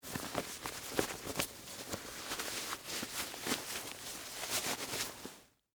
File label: Dress Shirt Roll Up, Sleeve; Foley, Clothes; Dressing